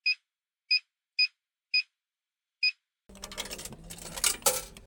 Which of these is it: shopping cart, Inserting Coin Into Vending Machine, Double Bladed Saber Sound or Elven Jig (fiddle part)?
Inserting Coin Into Vending Machine